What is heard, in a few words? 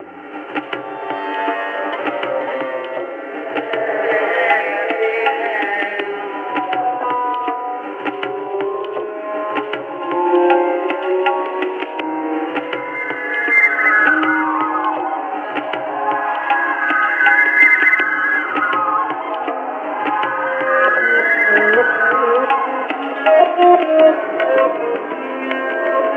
Bari Sax